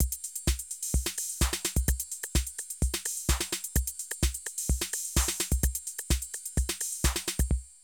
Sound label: Drum loops, Euro techno